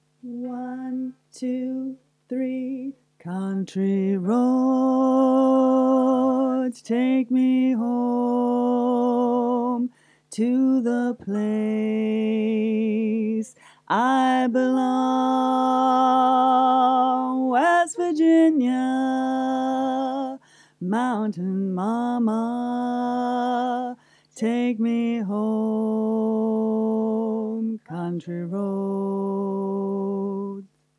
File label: low chorus